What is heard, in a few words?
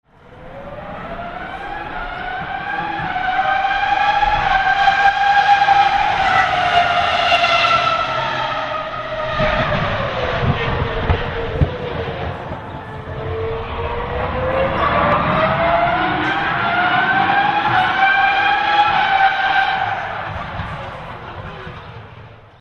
Bmw Williams F1